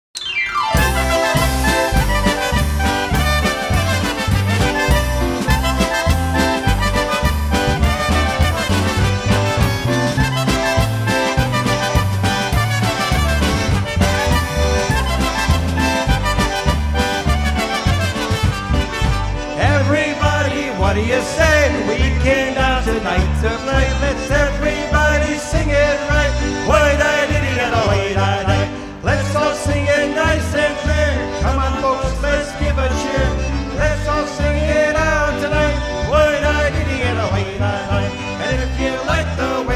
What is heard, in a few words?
Polka